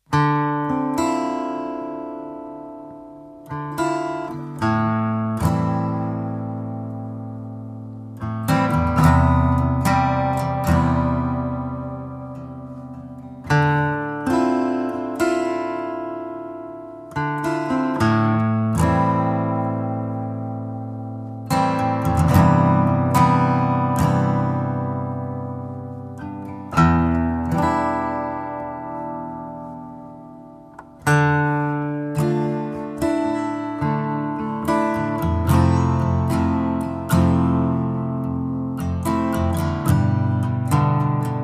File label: Guitar Piece